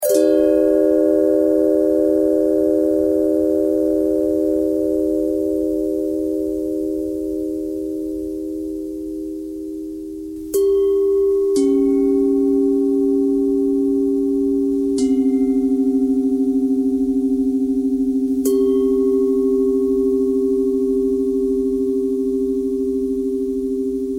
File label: bell thread